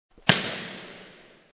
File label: Volleyball; sports